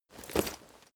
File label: leather metal walk normal single